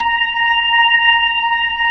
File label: B-3, Jazz